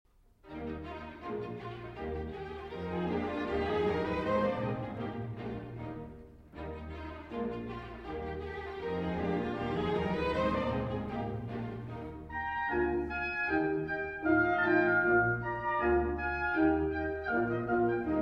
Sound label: Sinfonia Concertante in E-Flat Major for Violin, Viola